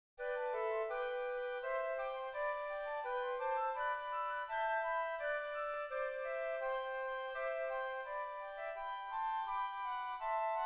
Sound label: Hymn Flutes